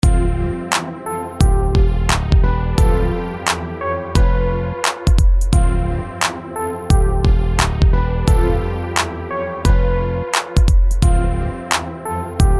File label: beat; 66 produçaz